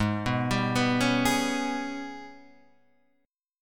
Ab Minor Major 7th Double Flat 5th, down, Guitar, Standard; AbmM7bb5; arpeggiated